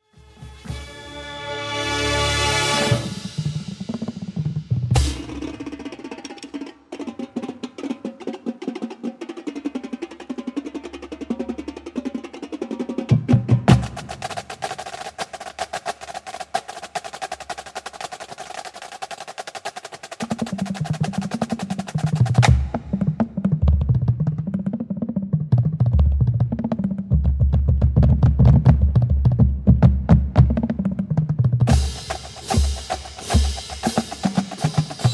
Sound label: Drum Feature; Batman Begins